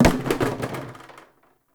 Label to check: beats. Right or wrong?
Wrong